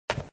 disc grab; toystory; toystorymania

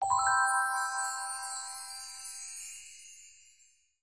charm book open; princess

